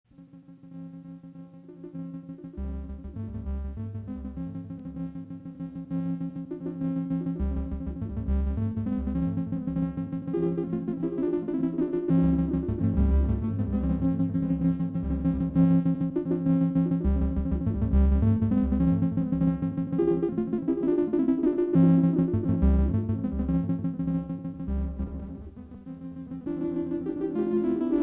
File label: Xmas Songs on Buchla